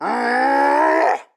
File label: Shield Scream; Pecheneg